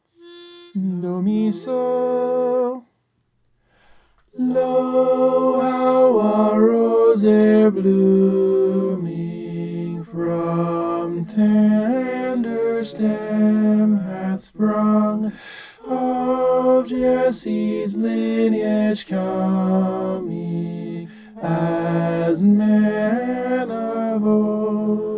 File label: tenor